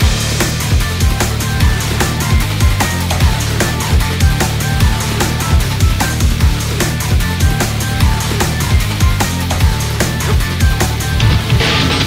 laser beam instrumental loop

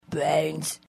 zombie groan; mvm forever

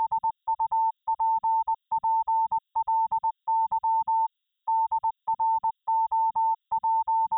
supply drop morse

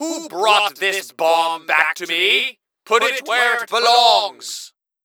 Bomb Pushed Back; Gray Mann MvM

